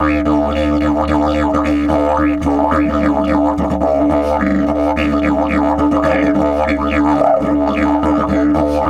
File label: 108, DIDGE 108BPM